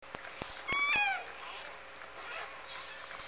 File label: call